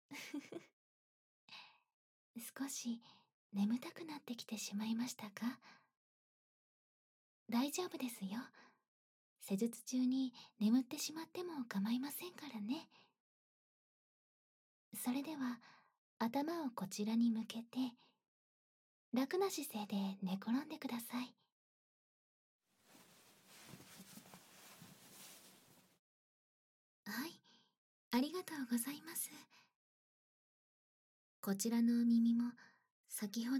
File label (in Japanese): 耳かき 左耳 反転, ASMR